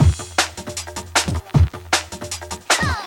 Box Break, 6B, Jungle Breaks; Blu Mar Ten - Jungle Jungle - 1989 to 1999 Samplepack

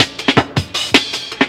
Flutes Break, 12A, Jungle Breaks; Blu Mar Ten - Jungle Jungle - 1989 to 1999 Samplepack

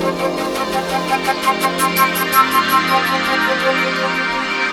Reed Breath Flute - 5A, Jungle; Blu Mar Ten - Jungle Jungle - 1989 to 1999 Samplepack

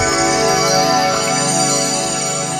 Pad, Jungle

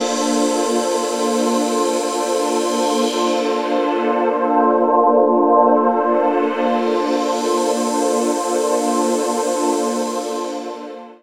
Pad, Jungle; Pads; Blu Mar Ten; Jungle - 1989 to 1999

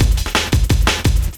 Walk Break 2, Breaks, Jungle; Blu Mar Ten - Jungle Jungle - 1989 to 1999 Samplepack

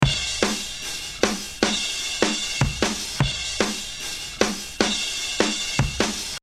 Muffled Groove (151); CRATES & BREAKS VOL.1 - BEEJ; DRUM GROOVES

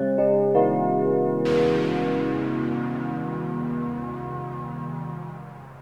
Spiritual Keys; Jungle; Blu Mar Ten - Jungle Jungle - 1989 to 1999 Samplepack

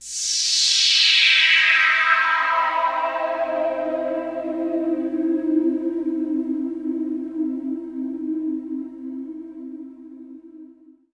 Fight Pad - 5A; Jungle; Pads; Blu Mar Ten; Jungle - 1989 to 1999

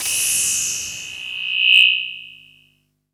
Chain Hisser; Jungle; Blu Mar Ten - Jungle Jungle - 1989 to 1999 Samplepack

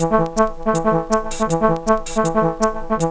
Horn 8A, Riffs, Arps & Hits, Jungle; Blu Mar Ten; Jungle - 1989 to 1999

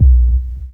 Champion Sub - 10A; Jungle; Bass; Blu Mar Ten; Jungle - 1989 to 1999

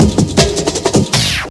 Effect Break; Jungle; Breaks; Blu Mar Ten - Jungle Jungle - 1989 to 1999 Samplepack

